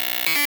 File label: Perc, Data Mosh Hits; 99Sounds; Mangling Audio